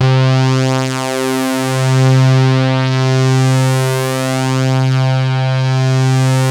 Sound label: PULZE SWEEP, BASSES; Masterbits; Vintage Voltage